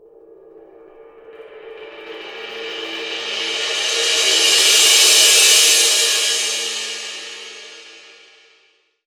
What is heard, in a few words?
Crash Cymbal, 22INCH IST MLT ROLL